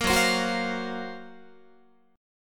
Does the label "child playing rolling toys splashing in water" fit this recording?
No